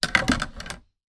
tennis racket place down hard surface; sport

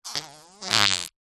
cartoon fart

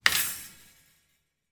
impact metal airer loose rattle

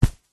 footstep single walking on thin fabric; zapsplat

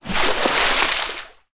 nature water movement splash

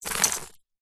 dog leash metal chain drop carpeted floor; animals

